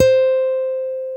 STEEL, Guitars; Spectrasonics; Hans Zimmer Guitars VOL-1; PARKER STEEL

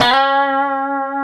D 4, COMPR STRAT, Guitars; Spectrasonics; Hans Zimmer Guitars VOL-1

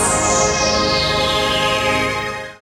SYN, DANCE SYNTH

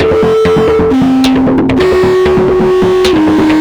philter (acid grunge); Sonic Foundry (Sony Creative Software); Ugly Remnants VOL-2

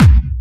hardbassdrumz, breakcore; Bazzdrumz

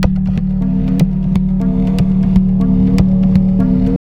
tabla, 120 bpm; Zero-G; Pure Tabla

